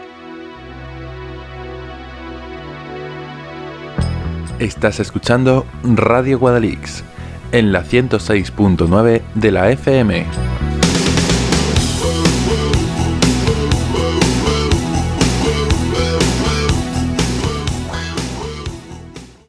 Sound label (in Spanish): cuña rock con dial